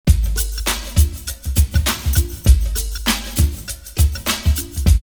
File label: Shuffle Jam 100, drumloops OldSchool; X-Static Goldmine